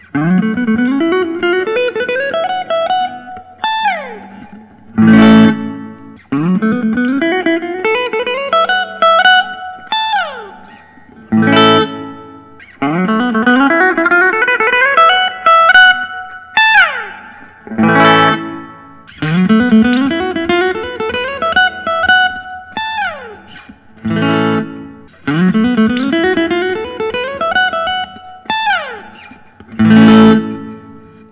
paul reed smith hollowbody ii piezo; black

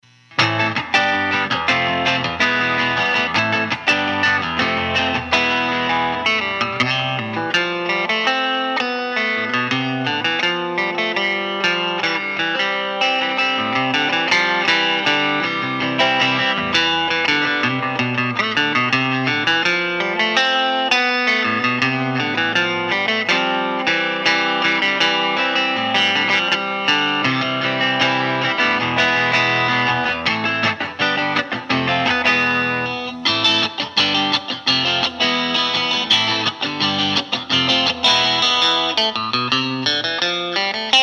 fender jazzmaster sunburst

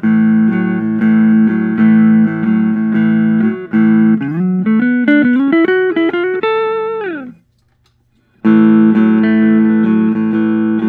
Paul Reed Smith hollowbody ii

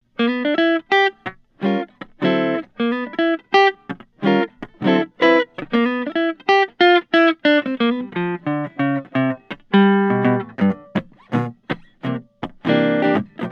Guild Benedetto flame maple

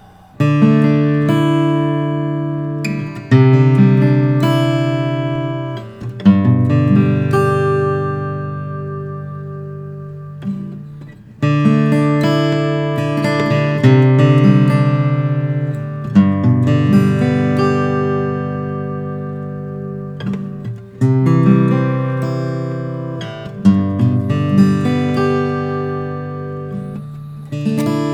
martin 00 42; 2008; linda ronstadt

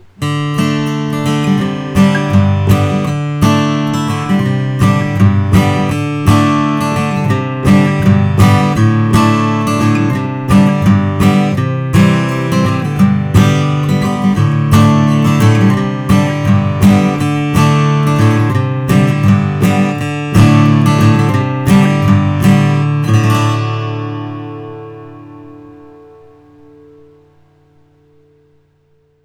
martin om 42 koa natural; aging

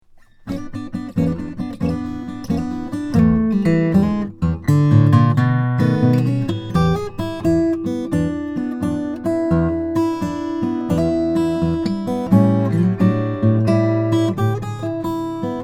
froggy bottom h14 deluxe black

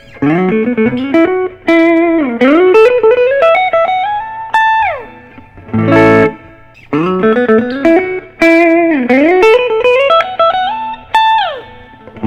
gibson true historic; aged

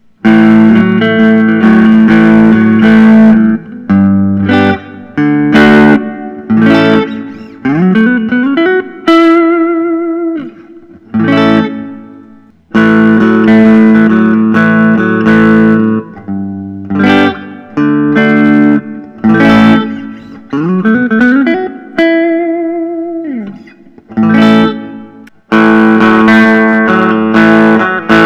probett rocket 59 faded lemonburst

